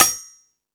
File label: FX Sounds, Drum Machines E-MU Pro-Cussion